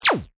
Laser Gun Fire, Shot; Science, Fiction; Laser Sound Effects

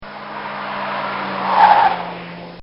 Fiat Driving; Cars; Vehicles; recorded in a custom built sound booth; Bobby Cole

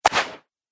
Archery Shortbow; Bow And Arrow Sound Effects; combat

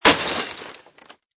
Short, sharp junk crash with some glass breakage; Good stereo definition; Crashes; Lynne Music

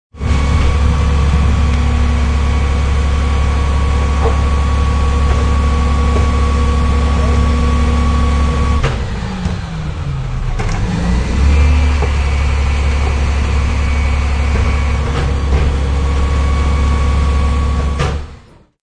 Garbage truck idling; Trucks; Vehicles

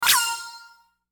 Whoosh Short Synth; Blastwave; Whoosh Sound Effects

